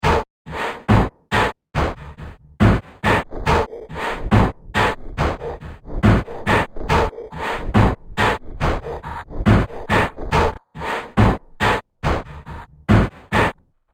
Droid Production Line, robot sound effects; science fiction sound effect

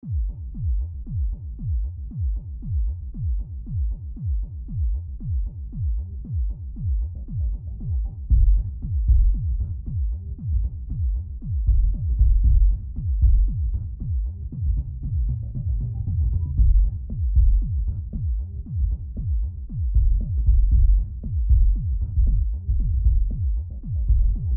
Club Music Distant Loop; Bars and Clubs; Backgrounds; Blastwave

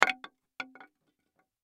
Impact Wood Stick Small Drop Bounce; Materials; Blastwave

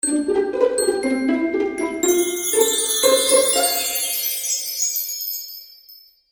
Magical Music Finale, Cartoon Music Sound Effects

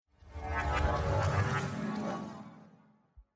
Metal Shimmer Whoosh Sweep Low Reverse; Sound Design Title Sfx; Tom Hutchings

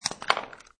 Tool Drop

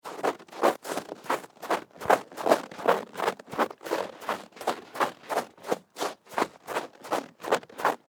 Footsteps Snow Run; Footsteps (Sound Effects:Human)

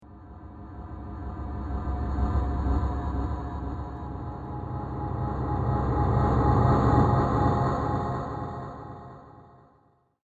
Scary Atmosphere Waves Of Fear; Scary Soundscape Sound Effects; horror

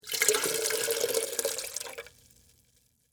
Water Pour Pitcher, Metal; Pouring; Sound Effects:Objects; BlastWave

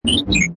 Button Digital Beep Bass Rumble; Scifi Production Elements, Set 2; Science Fiction Sound Effects

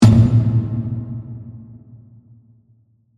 Hit Drum Dark

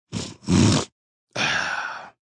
Snort Sigh, Snort

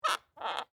Wood Creak Squeak, Old, Handle

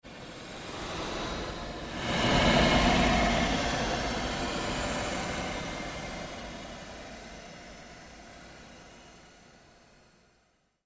Created Surge Long Sweep, Sweeper Sound Effects; Sound effects created by manipulating other sounds

